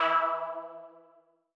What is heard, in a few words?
M Lead2 E3; KG MegaSaur